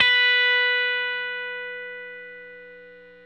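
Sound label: electric guitar sus B5 left; Sampleheads; Whole Lotta Country 1 & 2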